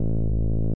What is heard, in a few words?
shield loop; golf blitz 1.12